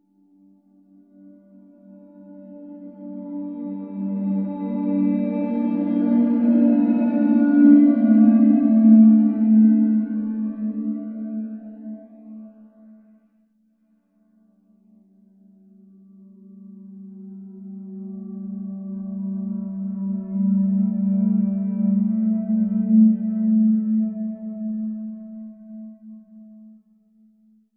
Tumbleweed Flute; Ethereal Textures; Flutes; Sonic Foundry; Ma Ja Le

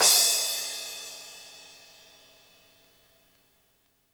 CRASH, Drum Kits

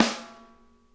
Interactive Drum Kits; Sonic Reality; 90s sample cds

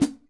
Pedal, drums; PX7; Digital Sound Factory Protean Drums

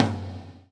GATE TOM, Drums Acoustic; USB Soundscan vol.10; AKAI; 90s sample CD

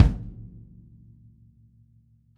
kick

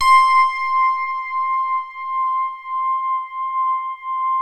FANT SOFT C5; Music Store - Claudius Diemeter Schwerlos; FINE ART 2

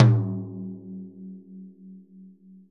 ROTOTOMS; Sonic Reality

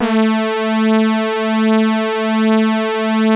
JX STAB A3, HARD BRASS; Music Store - Claudius Diemeter Schwerlos

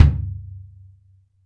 DW Jazz Kick, drums; PX7; Digital Sound Factory Protean Drums

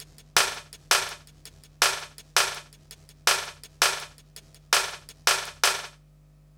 165 break, Explosive Jungle

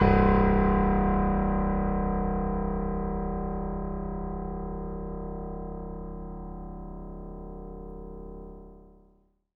MIX MONO, Acoustic Piano; InVision Interactive; Miroslav Vitous

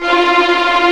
Vio Trem 16 F3; Multisamples; Digital Sound Factory Virtuoso

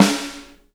SNARES, EDG, Drum Kits

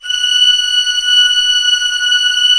ORCH C6

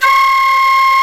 dizi C4; INIS; Opium

